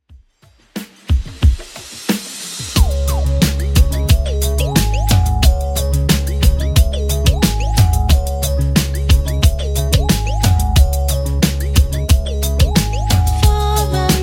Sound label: 80s Redrum 90bpm